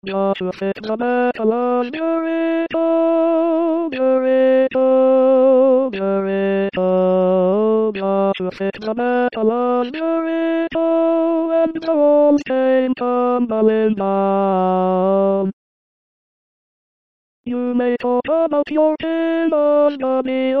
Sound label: Tenor